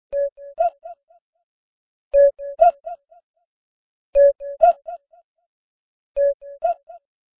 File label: kooky